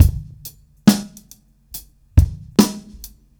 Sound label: drums; Dynamic DWM